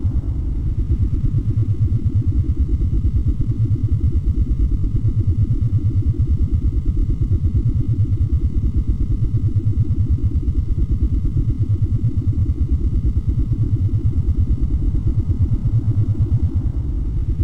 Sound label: Hoverbot Movement; FPS; Enemies; FirstMicroGame